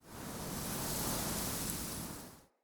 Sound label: Forest Open Glade Wind Gust Dry Grass; Day